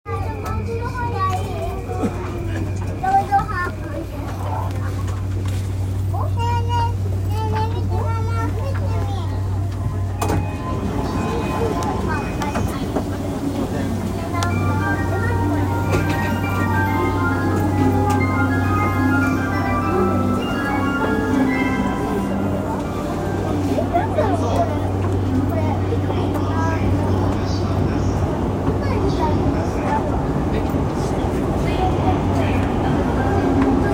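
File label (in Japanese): 山手線 新橋 clockwise, train sounds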